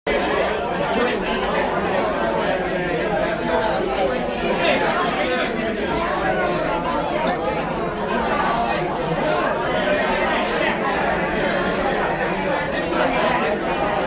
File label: crowd